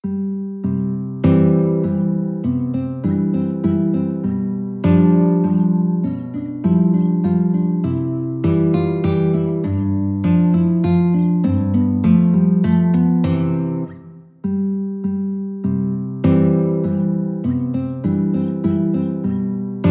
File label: german dance, guitar